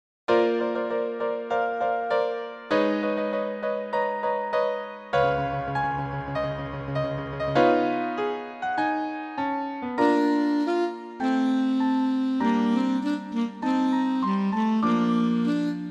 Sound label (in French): saxe